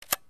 Clic; KNAPPAR; FJÄDERLJUD

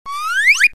cartoon slide; TECKNAT

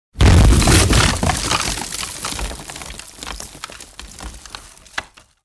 impact wood; BRYTLJUD; TRÄ

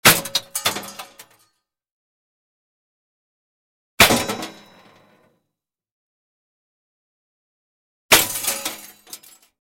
impact bullet metal; VAPEN; KULTRÄFFAR

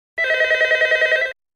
telephone; TELEFON; RINGSIGNALER

